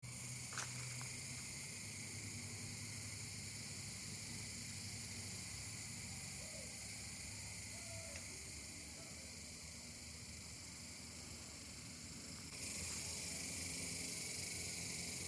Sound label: cicada sound effect